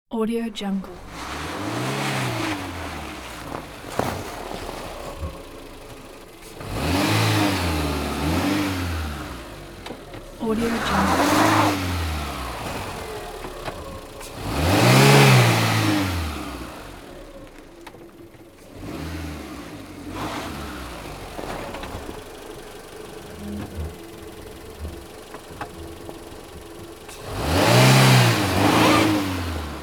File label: car nervous dig out from snowdrift